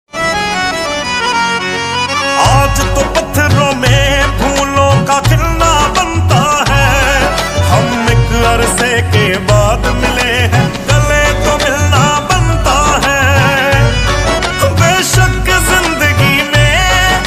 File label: punjabi ringtone